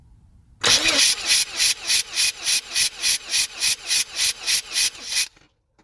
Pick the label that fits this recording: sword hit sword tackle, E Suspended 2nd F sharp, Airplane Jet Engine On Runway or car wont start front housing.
car wont start front housing